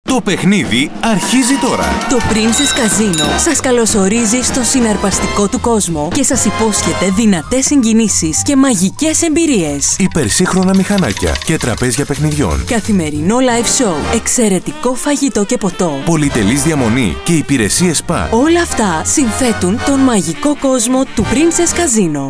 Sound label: corporate